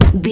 punch low deep impact

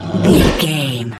troll monster effort action release

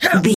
voice female attack

